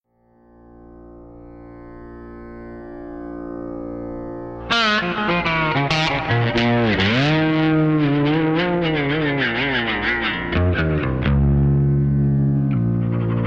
Rock Run drone, pedal steel

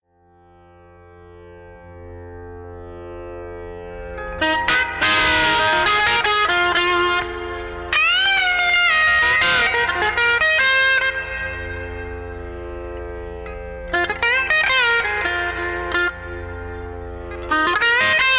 Muddy drone, pedal steel

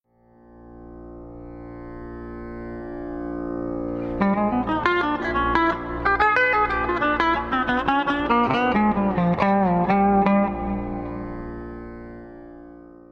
Dim Run drone, pedal steel

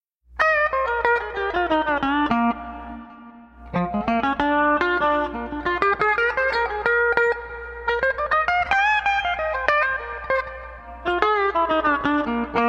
Blues Run, pedal steel

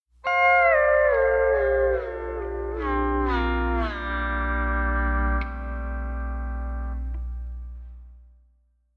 Sustain Down, pedal steel

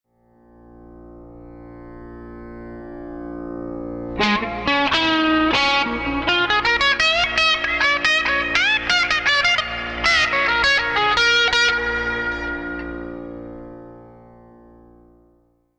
Classic Rock drone, pedal steel

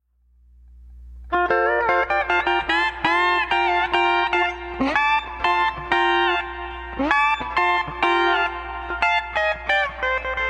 Bouncy, pedal steel

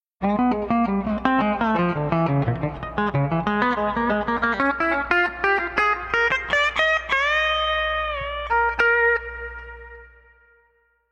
pedal steel

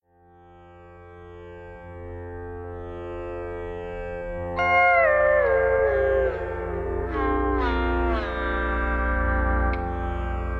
Sustain Down drone, pedal steel

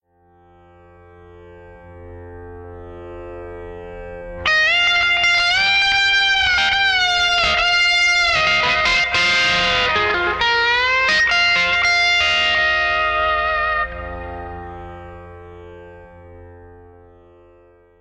Dirt Run drone, pedal steel

